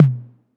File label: perc classic tom low